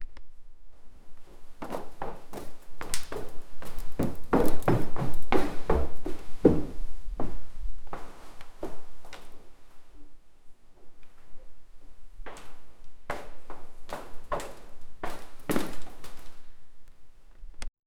getting up from a wooden

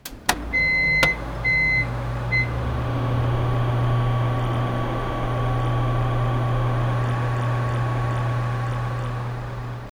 start with cpu start soun, computer

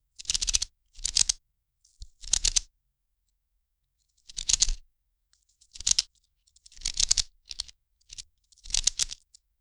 cards being shuffled

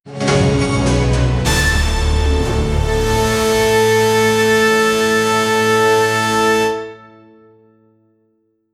generates a victory sound, banjo

